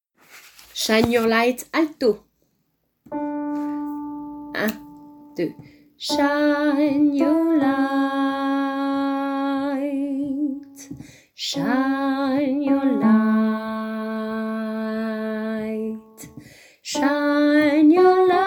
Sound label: refrain alto